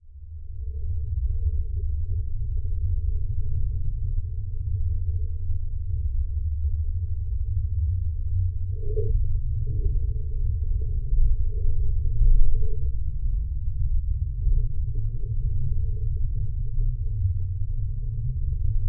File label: Geofon, Vibration, Dark, Metal; underground sounds